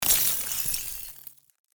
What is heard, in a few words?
Broken glass rattle small shards